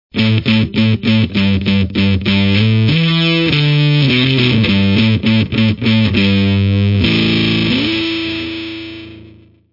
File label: fuzz